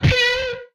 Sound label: Gun